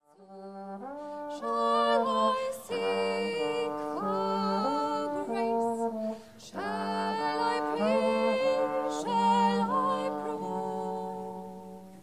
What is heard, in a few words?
choral